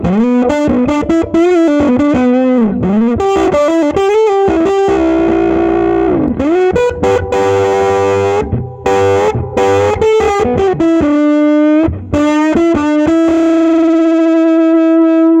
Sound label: Little blues lead